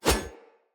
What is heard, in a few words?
Split Sword Whoosh; Weapons; Player; Swords; Starter